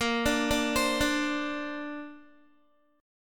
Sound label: Bb Major Add 9th, Guitar; arpeggiated; 17,17,14,17,14,x; 4F,4A,5C,5G,5C,6E; Bbadd9